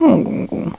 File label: PURR; dogz